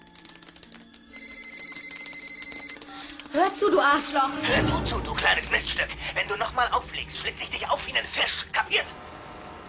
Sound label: Scream - Deutsch